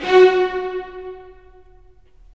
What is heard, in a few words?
STR, Roland; Roland - LA Composer vol. 4; Full Short